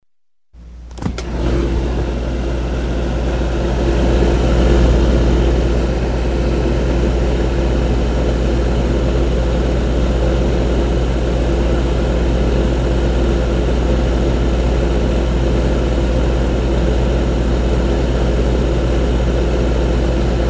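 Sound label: sound of refrigerator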